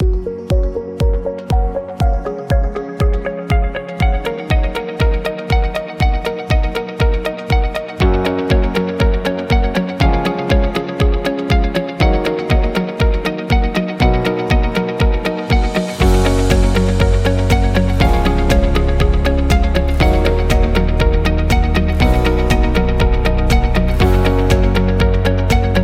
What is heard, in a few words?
Uplifting Background